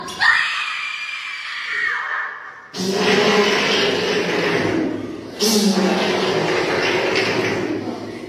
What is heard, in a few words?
Scream Fart